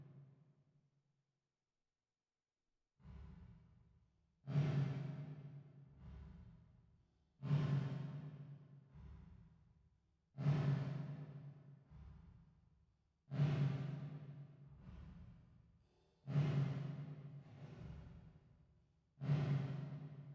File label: 480 Rev